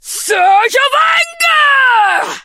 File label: hurt; bibi; thor skin